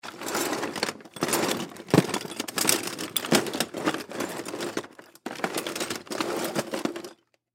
Box Junk Movement, Box Junk Rummage Long Settle; Rustling; Objects; Blastwave; Foley

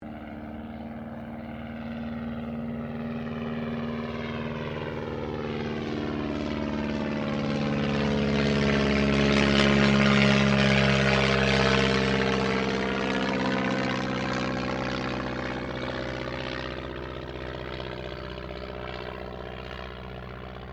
AIRCRAFT DH82A DEHAVILAND TIGERMOTH PASS

